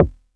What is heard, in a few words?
kicks, drum machines, FutureRetro FR777